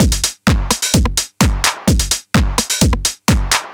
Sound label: perc loop 909 stomp 128bpm; GJaxx